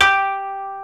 KOTO G3

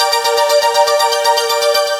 (arp) single chord; Sonic Foundry (Sony Creative Software); Ugly Remnants VOL-2

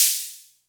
Hats, Elektron MachineDrum SPS1 MKII

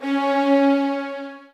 C, soft violins; Q Up Arts; Denny Jaeger Master Violin Library

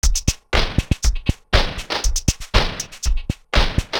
Bass Loop (120BPM); musicradar

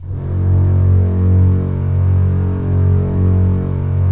STR, Roland LA Composer

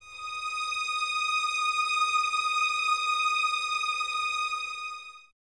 String Violins; String Ensembles; Miroslav Vitous; 11 Violins

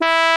POP BRASS

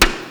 drum; Crypton - DrumBase5000; AFRICNRHYTHM

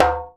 JEMB, DJEMBE; Univers Sons; Basicussions

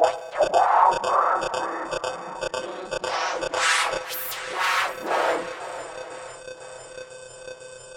Noise, Processed Noise

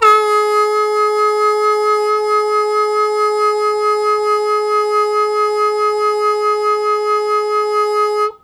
Long Sustain Fast Wah Wah Ab1, Harmonica; Zero G - Harmonica

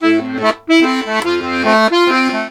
Accordions; USB Soundscan vol.40; AKAI

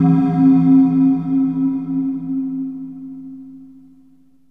FX; AMG Megabass REMIX! VOL-2

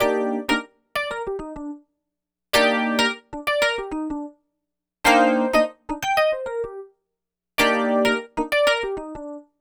EP, Keyboards, Electric Pianos

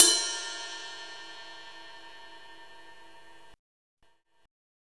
Ride set, Drum and Bass; Ride Set 2; Instrument Samples

